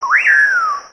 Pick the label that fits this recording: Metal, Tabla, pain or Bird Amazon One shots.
Bird Amazon One shots